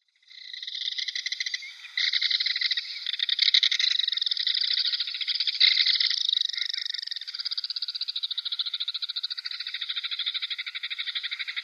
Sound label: Belted Kingfisher